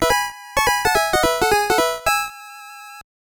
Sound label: Stage Clear; Type-A; Yoshi; NES